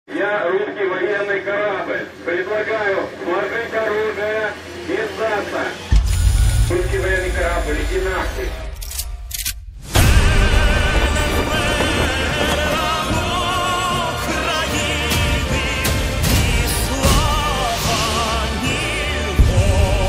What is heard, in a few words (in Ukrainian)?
Гімн України miltary style